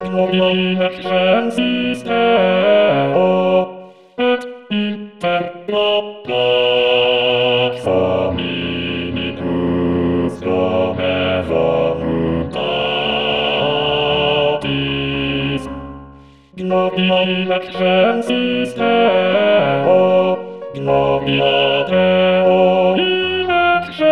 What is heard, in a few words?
bass; gloria